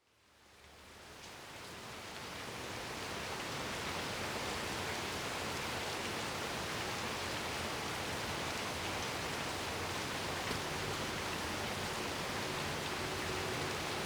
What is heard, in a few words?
Gentle Melody Rain Sleep